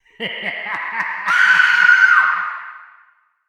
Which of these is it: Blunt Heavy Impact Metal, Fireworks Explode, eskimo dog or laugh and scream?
laugh and scream